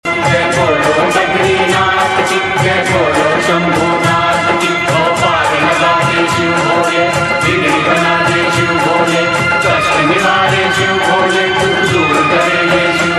Jai Bolo Badrinath Ki Jai Bolo Shambhu Nath Ki Female Version